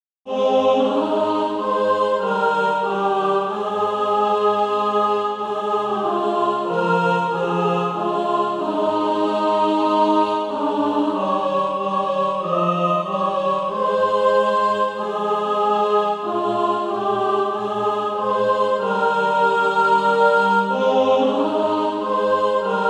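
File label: tenor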